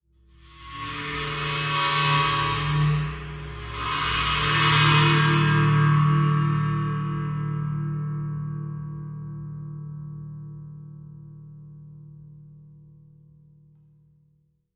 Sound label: China Cymbal Bow, cymbal & gong; China 16 Cymbol Bowed 5